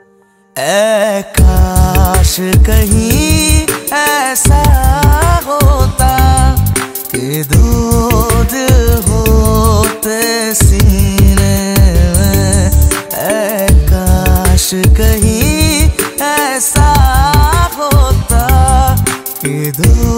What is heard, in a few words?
Sad Song Ringtone